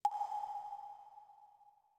water drip; Rust

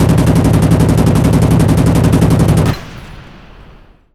plane; World of Tanks

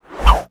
cable whizz by

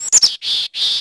birds; World of Tanks Blitz; hangar 9 may

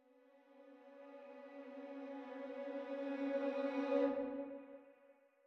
music interactive; World of Tanks

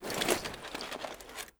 reload begin; Rust

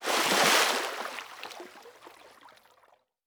water transition out; Rust

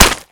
Projectile Sounds; Red Orchestra Ostfront 41-45

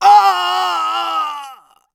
death; Escape from Tarkov; scav3

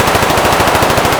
mp5 indoor distant loop; Escape from Tarkov

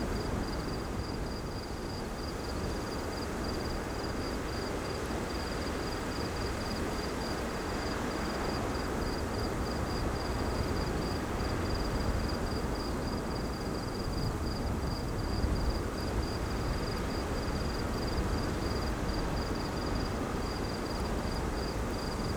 ambience forest night windy dry; Rust

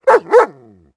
dog; World of Tanks Blitz; hangar 9 may

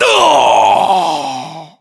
death, voiceovers; ModernWarfare; localized english

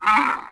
Camel Hit Vox; Civ4 warlords

